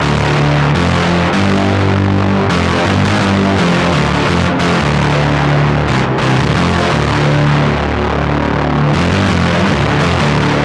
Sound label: Analog Synth Fulltone GT500